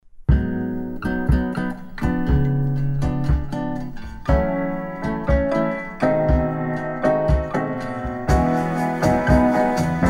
analog

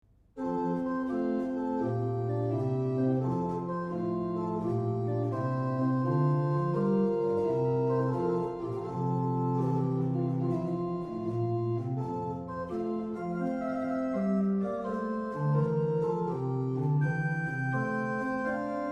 Air In F Major; Leatherhead

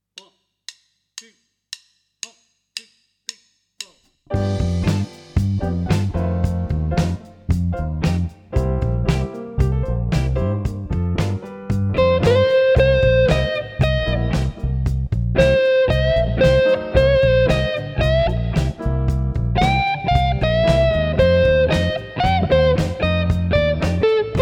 Straight 114bpm in C Performance

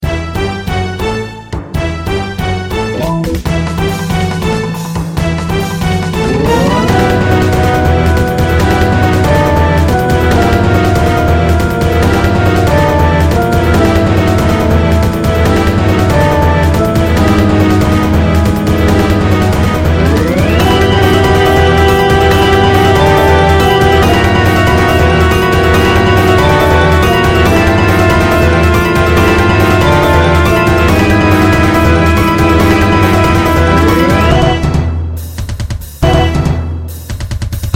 Suspense

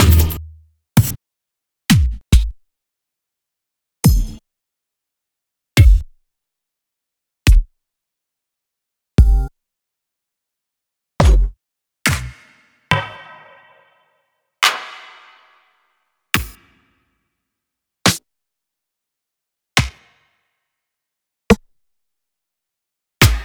dubstep samples; ghosthack

